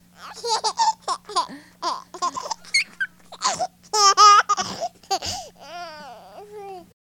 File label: laugh